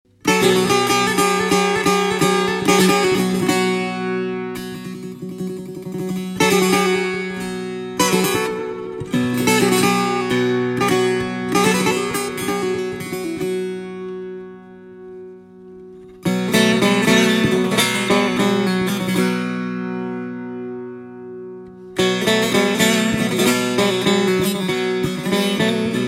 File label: Taknavaziye Setar